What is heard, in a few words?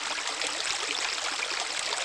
Water; Zelda; OOT